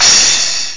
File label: Crash Cymbal; drums